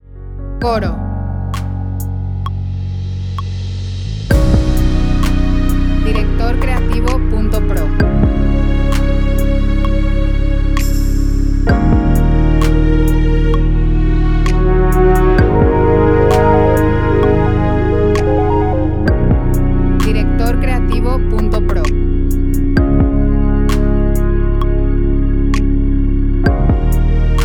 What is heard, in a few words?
65bpm 4/4 E Gb